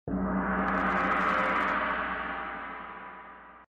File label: gong